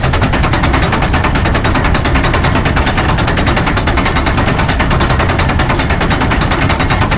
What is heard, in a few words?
Abrams Move; DCS